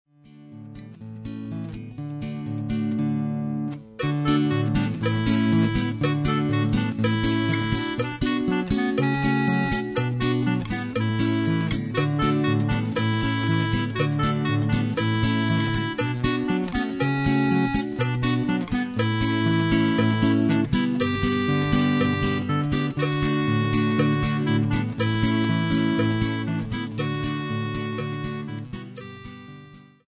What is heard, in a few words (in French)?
danse de l'été instrumental